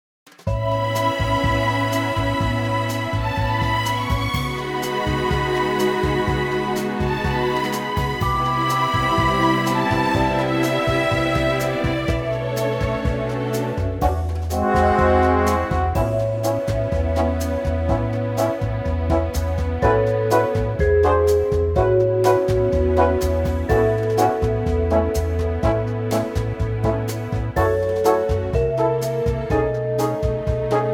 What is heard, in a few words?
A orchestral; key - A - vocal range - A to B; lovely orchestral latin arrangement of this classic American standard. A nice laidback tempo